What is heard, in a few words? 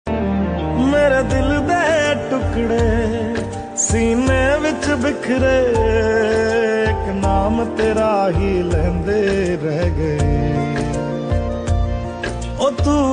song